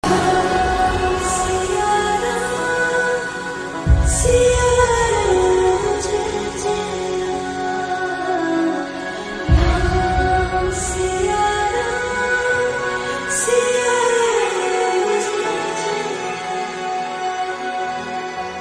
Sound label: slowed reverb; adipurush; prabhas